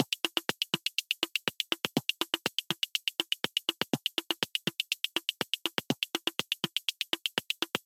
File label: 122 BPM Micro Drums; MMB Studio Melodic